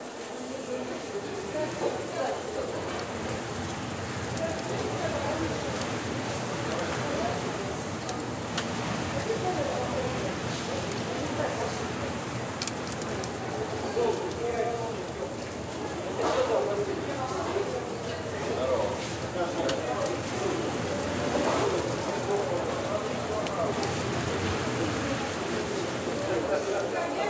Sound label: yarmarka ambient crowd